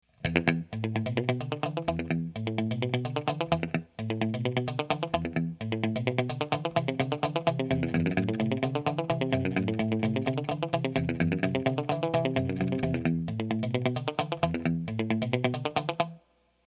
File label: tape ping pong